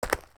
Footsteps Leather Shoes Gravel

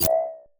Zap Blip UI

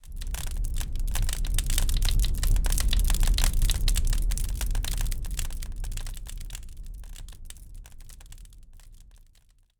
Fire Crackles Burning

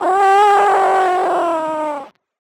8 bit Monster Mini Thin High Pitch Voice

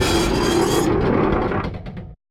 Rusty Metallic Screachy Drag Push Pull

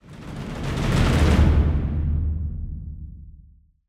Cinematic Drum Roll Transition

